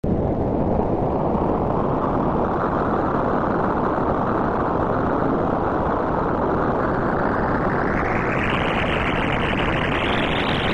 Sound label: Kettlez